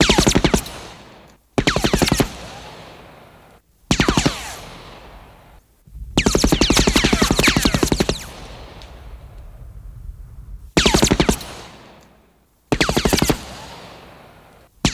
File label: Machine Gun Silenced, silenced bursts